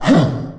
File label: mother knife throw; finalmother